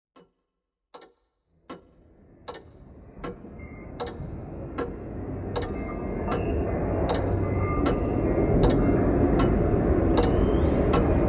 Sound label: Electronic; noise